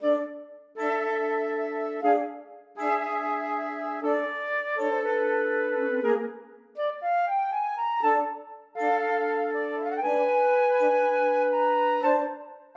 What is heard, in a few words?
walking flutes